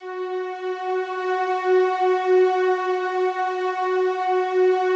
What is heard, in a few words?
slow strings F#3, Yamaha VP-1; 90 s Sample CDs; Music Store